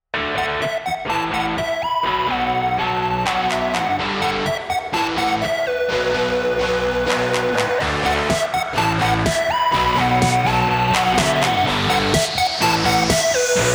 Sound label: Jingle rock